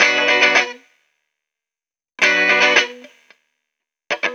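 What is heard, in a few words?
guitar, Roots Funk; USB Soundscan vol.41; AKAI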